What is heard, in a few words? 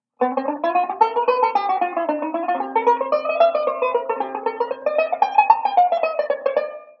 Connected 3rds jazzy, banjo; Beyond Chord Melody Volume II